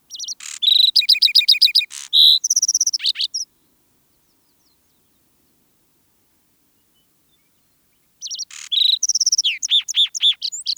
zvuki zhavoronka

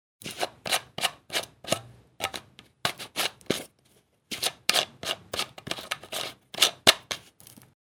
zvuk kryshki

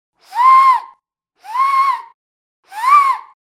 zvuk svista

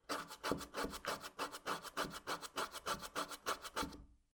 skrebushhie zvuki

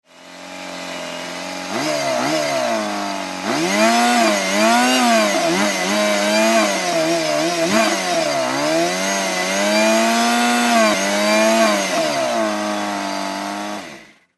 zvuki benzopily

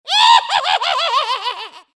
zvuki vedmy

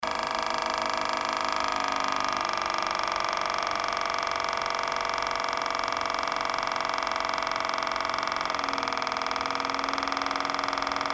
zvuk mashinki dlja strizhki

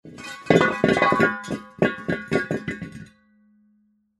zvuki shinomontazha

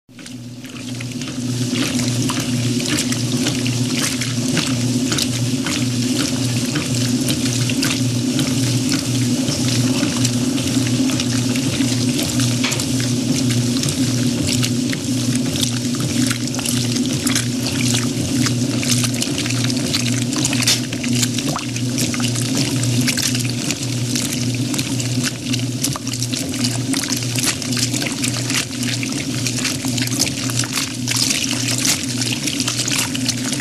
zvuk stiralnoj mashiny

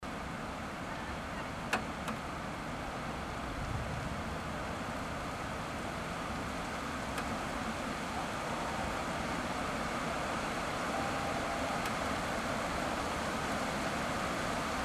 zvuk dozhdja

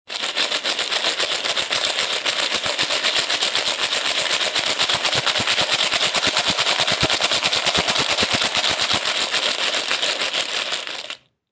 zvuk trjaski

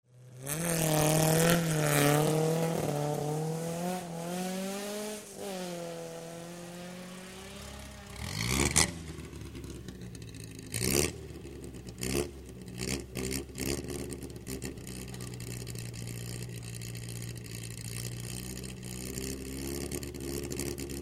zvuki avtogonok

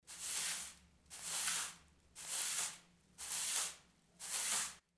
zvuk metly

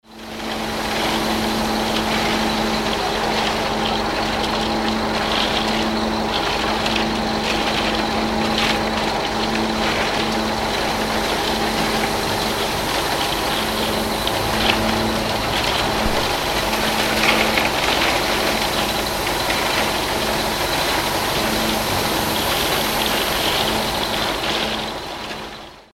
zvuki betonomeshalki

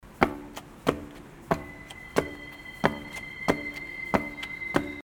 zvuk shagov

